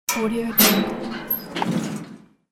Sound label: metal refuse dump close